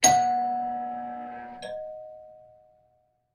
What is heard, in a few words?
Door Bell